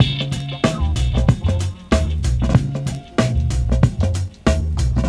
Hiphop, ringtones; Google; NewWave Labs

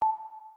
Particle Lock, Samsung Galaxy S5

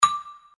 dial tone marimbas 9; Oppo Neo 5

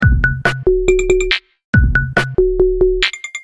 Noise, ringtones; 'Lectro Beat; Google; NewWave Labs

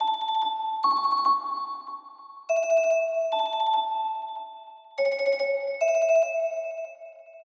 system ringtones abstract

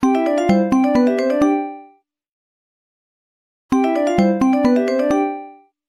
Answer; ZTE; Axon-20-5G

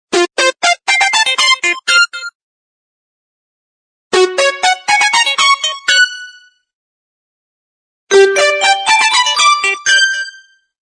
Jewel Tone; Samsung SGH-M110

